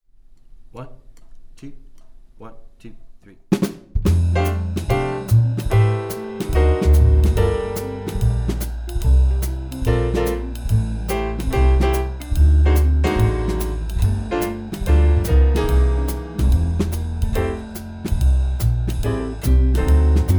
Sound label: swing etude rhythm section no guitar solo